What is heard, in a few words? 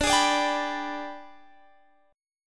G sharp Minor Major 7th Flat 5th